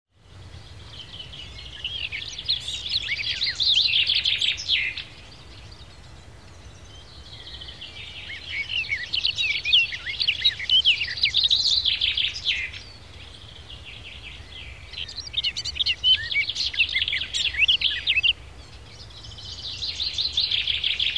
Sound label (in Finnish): linnut